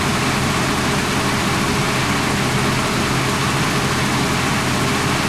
panzer6 engine rpm, engines tanks; War Thunder

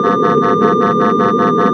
Cockpits RWR Launch Warning US; DCS

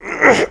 player climb efforts; Call of Duty Modern Warfare

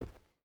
footstep rubber boots cloth run; Rust

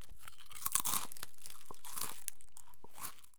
crackers eat; Escape from Tarkov

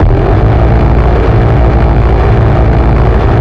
GAU8 Cannon Low In; Weapons; DCS

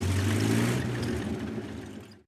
engines; World of Tanks

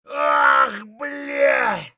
bear2 hurt medium; Escape from Tarkov

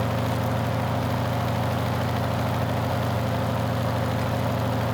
engines; World of Tanks

